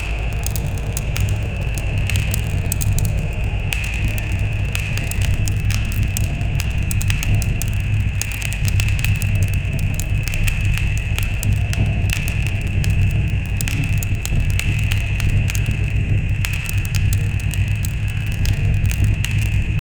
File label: hyper realistic fire crac